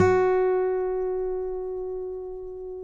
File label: F#, guitars; Spectrasonics; Hans Zimmer Guitars VOL-2; FNGSTYLE PFM